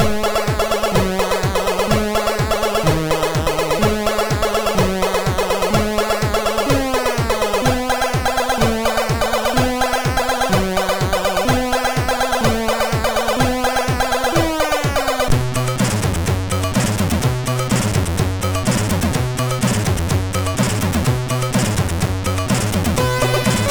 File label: Action News tune, MOS 6581; MOS6581R3